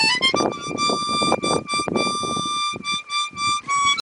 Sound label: Harmonica